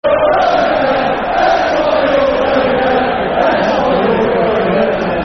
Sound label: Going Down, Football Chants (Soccer Songs); Plymouth Argyle Pafc